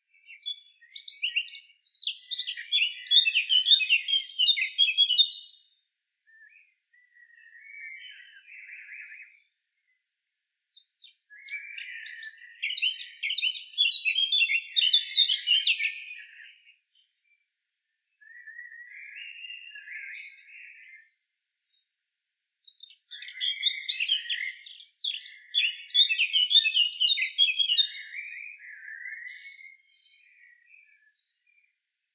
chant fauvette a tete noire; oiseaux

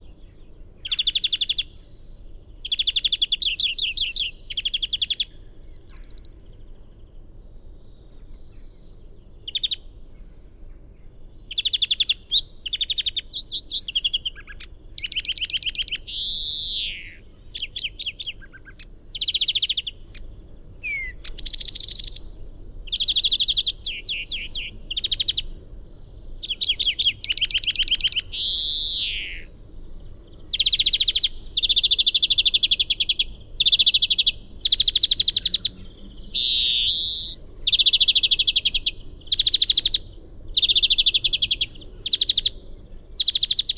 chant verdier; oiseaux

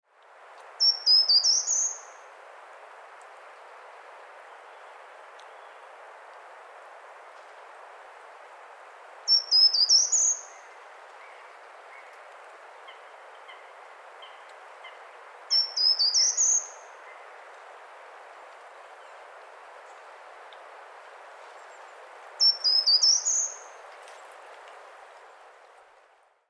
chant grimpereau des jardins; oiseaux

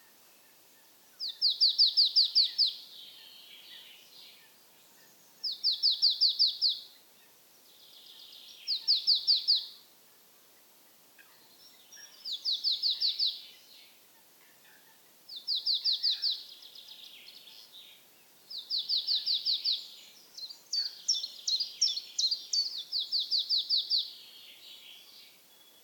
Mésange nonnette; oiseaux

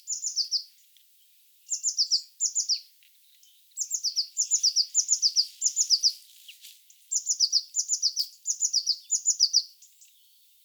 chant mesange bleue; oiseaux

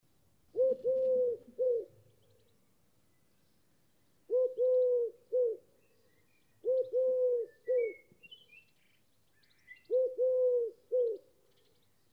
chant tourterelle turque; oiseaux

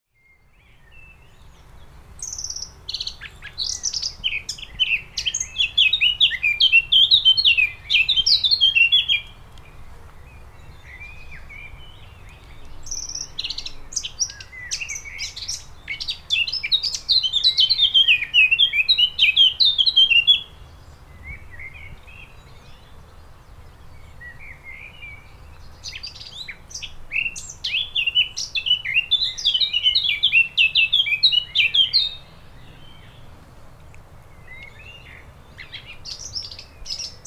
chant fauvette a tete noire; oiseaux

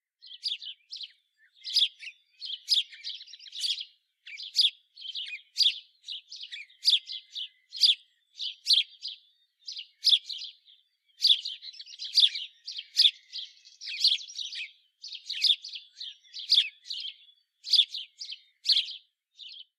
chant moineau domestique; oiseaux

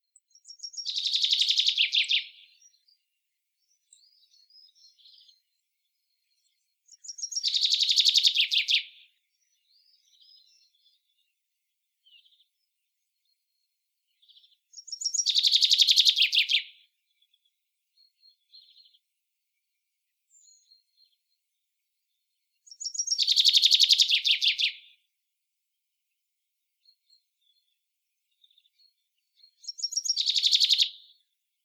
chant pinson des arbres; oiseaux

